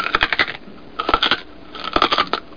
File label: big bite